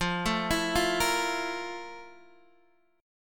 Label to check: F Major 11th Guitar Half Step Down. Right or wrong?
Right